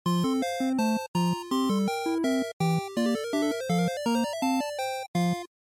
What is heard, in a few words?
NES Sports Miss